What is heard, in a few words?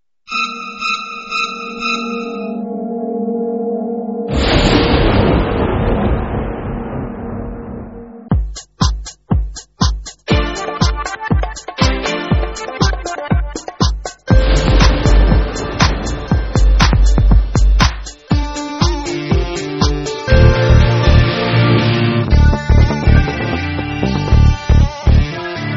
Hip Rock; Habbo